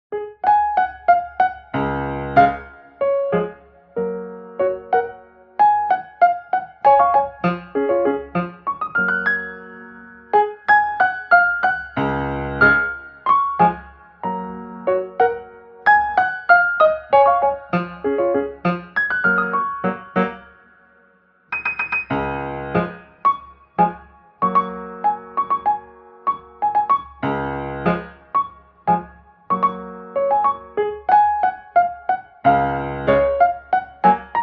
Piano cover; ANIMAL CROSSING： New Leaf

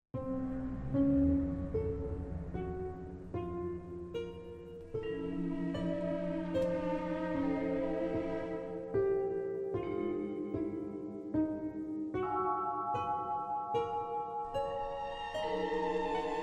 instrumental

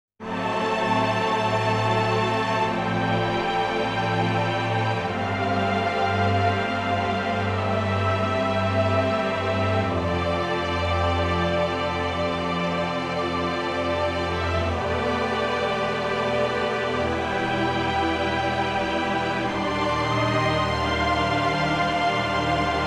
Thunderstorm; FF-XVI

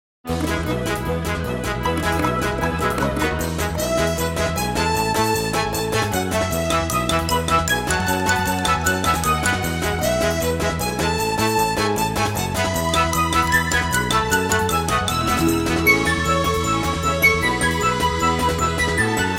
orchestral arrangement; Mario Party 2

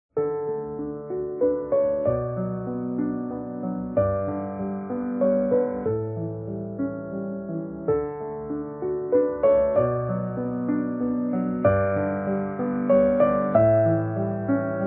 Piano cover; Animal Crossing

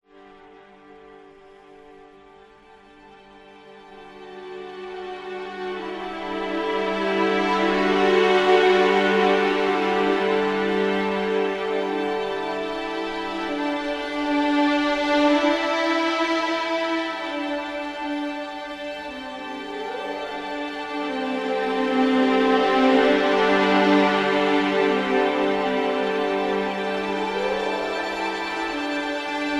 peaceful alpine ambience; Tabletop RPG Music

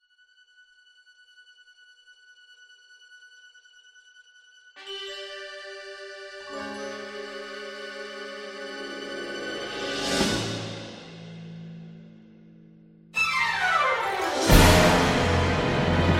orchestral arrangement; Twilight Princess

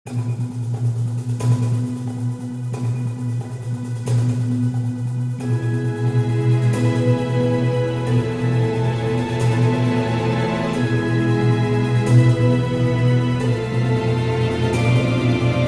Orchestral Arrangement; Ocarina of Time

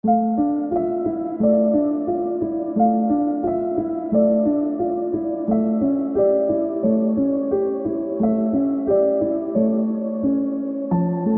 Music Box, Piano Cover; Little Nightmares 2

